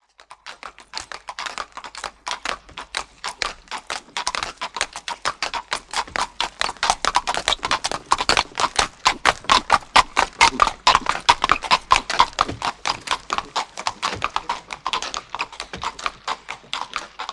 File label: By Horse Carriage Pas, carriages